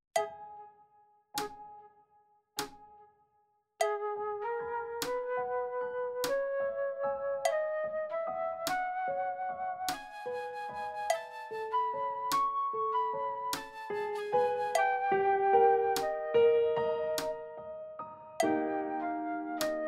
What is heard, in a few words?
Piano Flute Medium; Uebungs-CDs; Playbacks